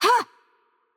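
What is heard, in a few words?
Attack Light, Portuguese; VO; bloom minigame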